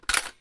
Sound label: Gun impact